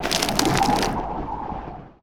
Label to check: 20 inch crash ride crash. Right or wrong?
Wrong